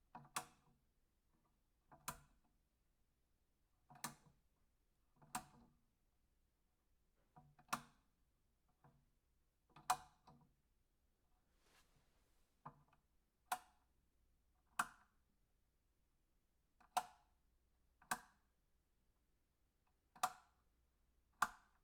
lamp switch near

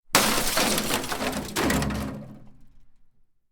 object toss and smash glass

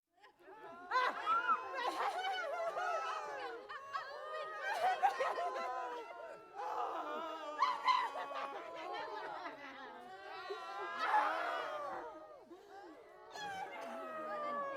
voices moaning

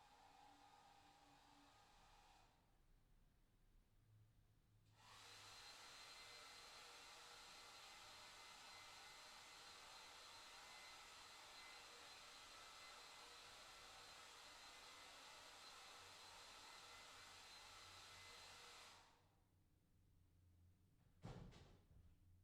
hairdryer in other room

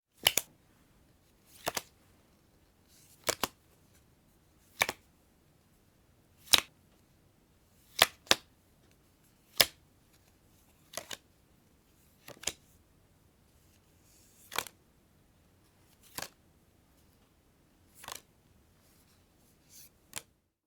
card dealing

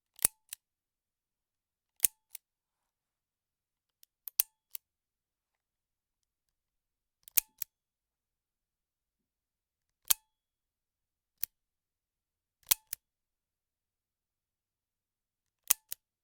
revolver trigger pull empty

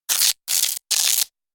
hair ripping

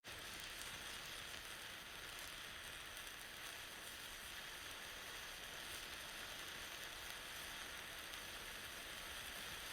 emergency flare burns

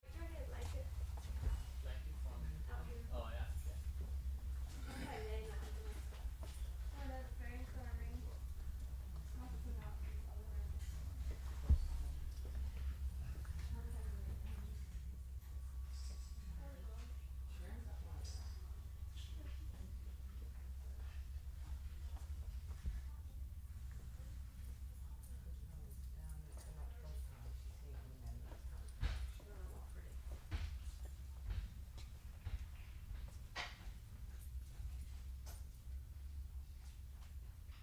retail background noises

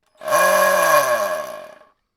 small drill motor on metal plate

